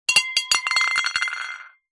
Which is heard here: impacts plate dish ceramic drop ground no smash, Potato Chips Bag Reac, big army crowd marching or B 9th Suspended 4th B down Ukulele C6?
impacts plate dish ceramic drop ground no smash